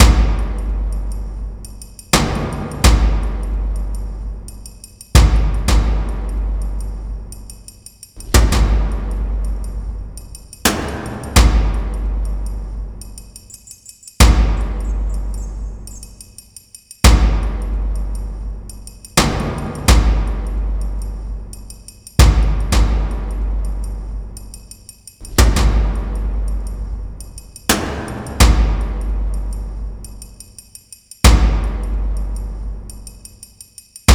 Music Drums For Sniper Ambush; Battlefield 3

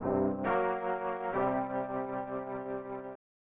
Unknown Jingle; Turn and Burn: No-Fly Zone; SNES

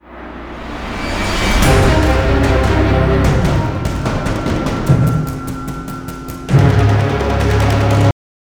Riser

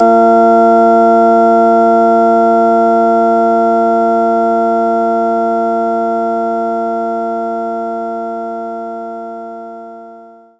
Hammond Organ; knights try

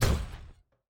Footstep Robot Large

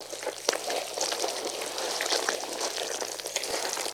Snail Walk

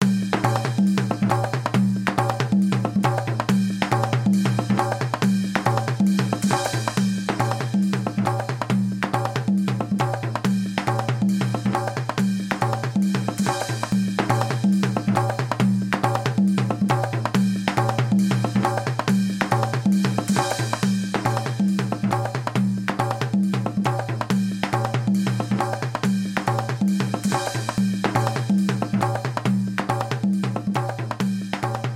bgm sea story beats

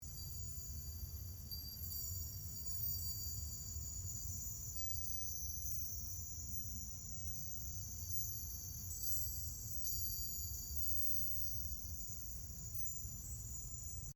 Floating Symbol Ambience

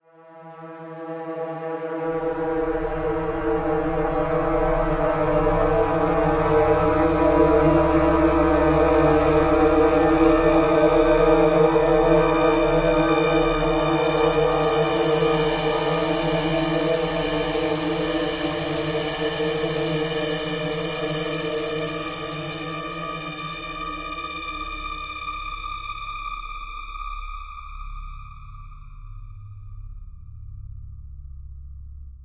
Ambient Brass; kholat gamerip 2015

